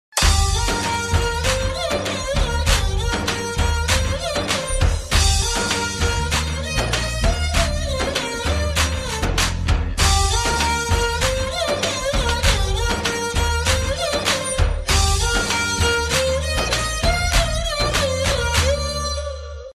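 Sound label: violin ringtone background music ringtones; Arya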